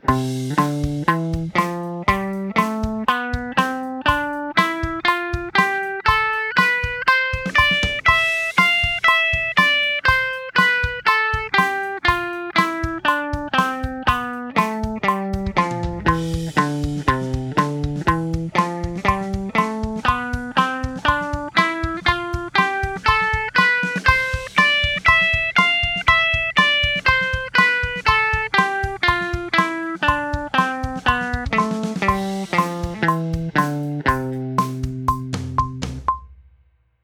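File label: A minor #3 Ionian 120 bpm quarter, guitar; a minor diatonics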